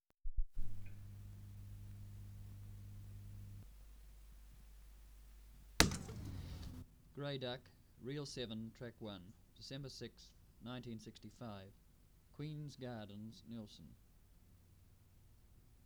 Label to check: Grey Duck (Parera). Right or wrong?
Right